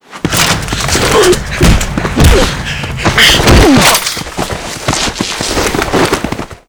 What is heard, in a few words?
melee struggle